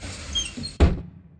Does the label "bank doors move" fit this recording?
Yes